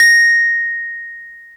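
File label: SCARY RHO; RHODES FUZZ; E-MU Producer Series Vol. 8 – Platinum Phatt; EP FX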